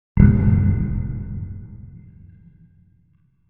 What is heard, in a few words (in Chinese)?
惊悚震惊; squidGame